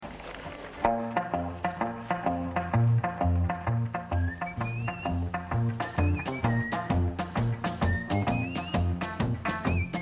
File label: Improvisation